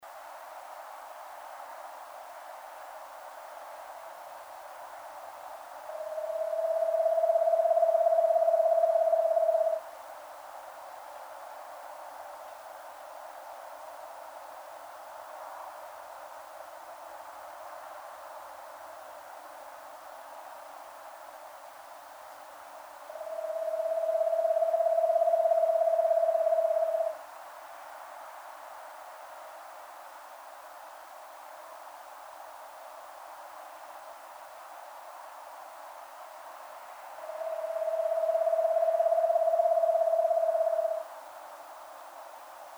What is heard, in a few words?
Eastern Screech Owl Megascops asio